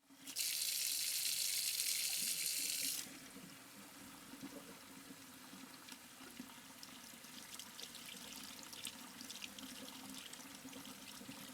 water into surgical tray

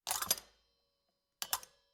metal parts cling

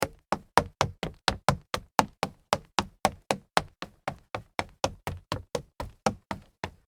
sneaker stairs up run solid wood

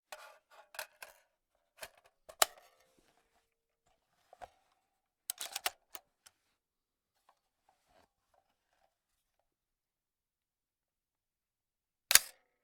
rifle mag load

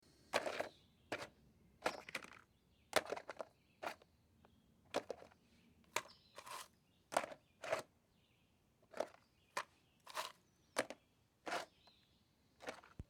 opening plastic storage container

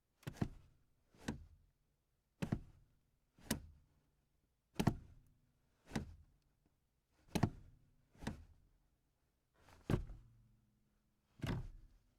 gas pedal

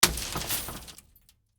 trash dropping in dumpster

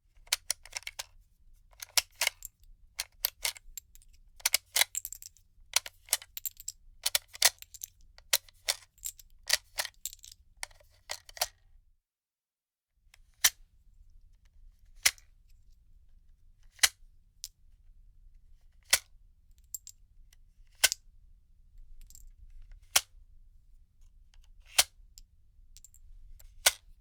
unloading gun magazine series

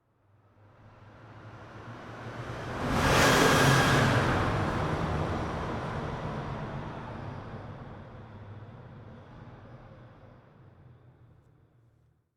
sports car tunnel passing

